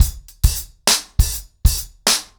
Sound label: 100BPM, ACOUSTIC DRUMS